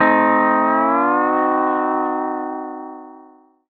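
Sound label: pedal steel chord A dim7 L, Country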